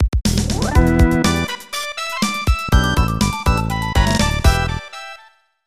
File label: Lose Friendly Match; Dino Dini's Soccer; SNES